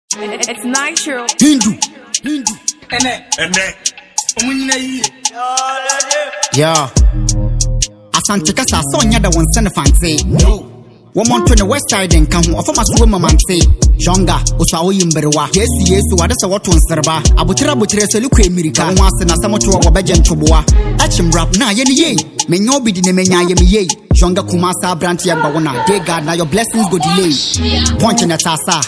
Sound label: diss